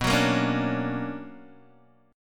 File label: B Minor Major 9th, Guitar, Open D